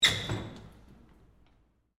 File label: Door Open Gym, Foley, Door, Open, Gym, Annex; Miscellaneous Doors; BlastWave